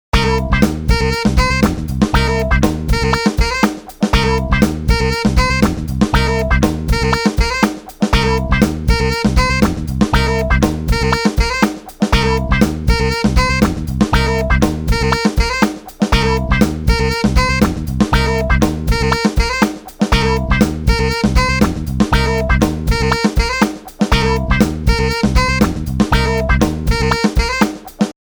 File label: radio jingle